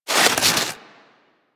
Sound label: Armadillo Roll Snow